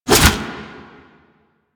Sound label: Rifle Swing; Lasrian Lancer Rifle; Lasrian Soldiers; Weapons